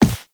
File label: Run Default, Footsteps; Warframe; Operator